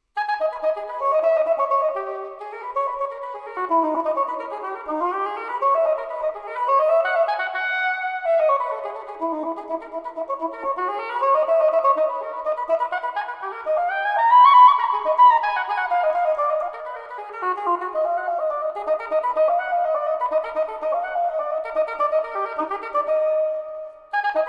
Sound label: Suite in A minor Courante; after BWV 1008; JS Bach